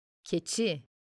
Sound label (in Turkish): Keçi